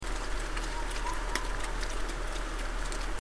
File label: mystery call